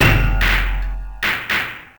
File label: The Curtain Falls (Game Over); Samurai Shodown; Samurai Spirits 2; Neo Geo; arcade